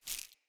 Grunt Foley Step; Enemy; Characters; Corrupted Sproutfolk

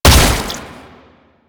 Underground Attack Tentacle Slam; Enemies; Abilities; Warframe; Proto Infested; Babau

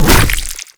Infested Ancient Tentacle Swing Hit; Warframe

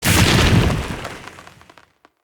Random Lightning Discharge; Characters; Enemy; Abilities; Nimrod

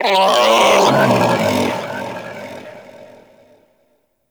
predator lure call success; Warframe; Hunting Critters; Characters

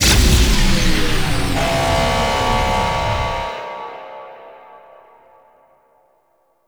Cannon Hack Success Stinger; Makvoss Cannon

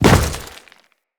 Step Dirt, Footsteps; Grineer

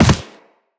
Land Soft Default, Footsteps; Characters; Operator

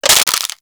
Finisher Bone Break; Kuva Lich; Warframe

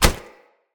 Unarmed Punch Impact Flesh Armour Hollow; Weapon Impacts; Player; Combat

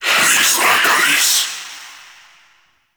Life Screams; Warframe; Dante

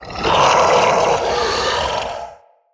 Male Vocal Breath Heavy; Enemy; Mockery; Mockeries

